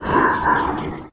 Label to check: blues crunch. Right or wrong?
Wrong